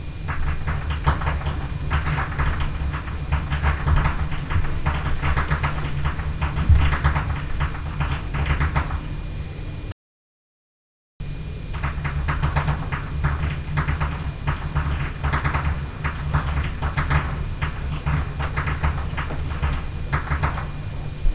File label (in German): Jugend, schnalzen; Thundorf